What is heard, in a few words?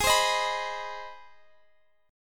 C Minor Major 7th Sharp 5th G sharp